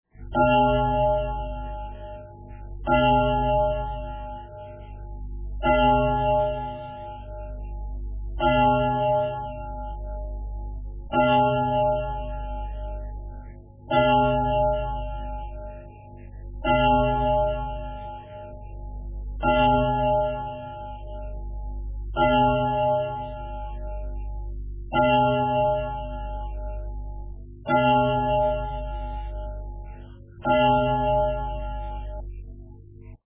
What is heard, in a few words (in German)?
glockenschlaege